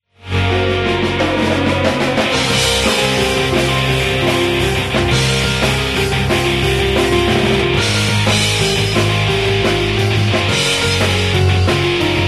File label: bells strings